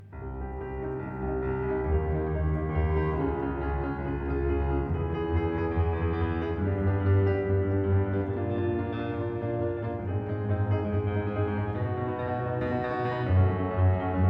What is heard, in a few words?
partita in c ciaccona